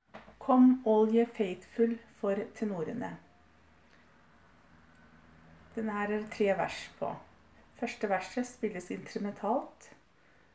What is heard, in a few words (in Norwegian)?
Tenorene; Come all ye faitfull